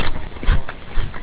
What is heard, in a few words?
eat; openrsc